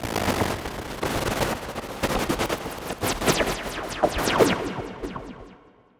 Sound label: Noise, Processed Noise; hiss crackle